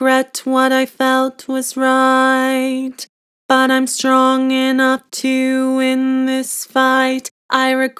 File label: Vibrato, Vocals; Regret what I felt was right; Harmor Vocals